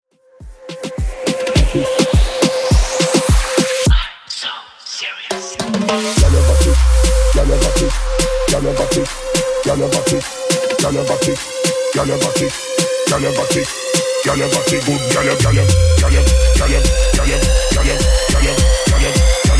Moombahton Remix 104bpm

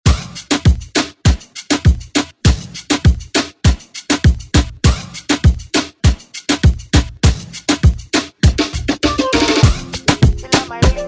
Moombahton Redrum

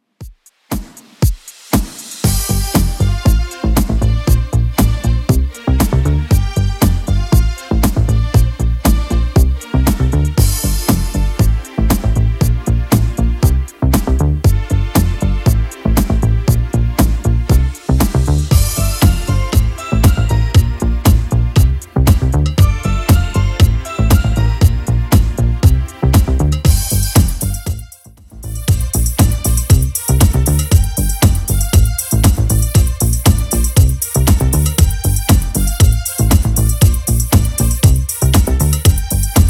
80s Redrum 118bpm

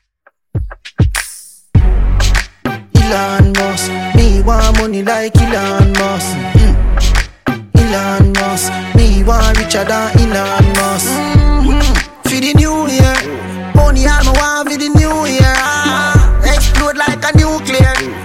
Funk Mash Up Remix 100bpm; remixplanet

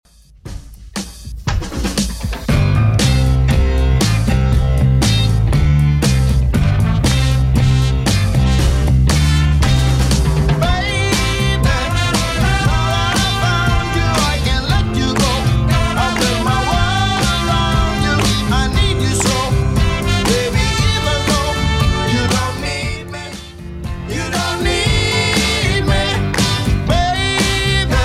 60s Redrum 118bpm

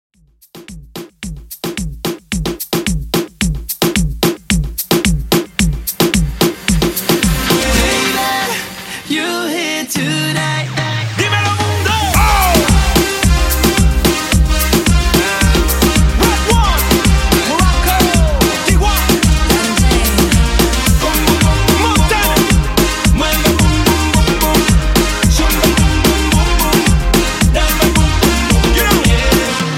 Reggaeton Mixshow 110Bpm

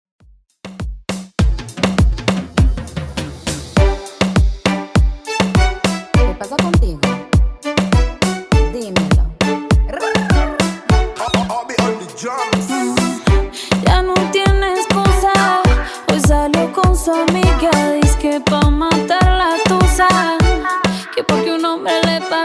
Top40 Reggaeton 101bpm; Redrum

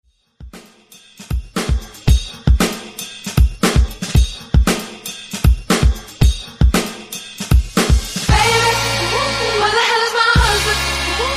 116 BPM

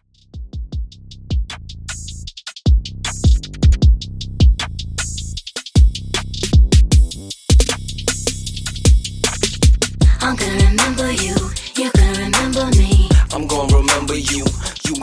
redrum 155 bpm

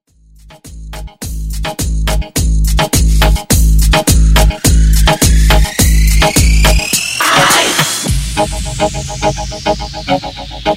Moombah To House Transition

